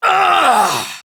Pain Female; Warframe; Tau; Prime Grineer Female; Battle

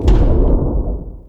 Step A; Erra; Sentient Throne Room Gameplay

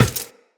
Run Glass, Footsteps; Characters; Tenno

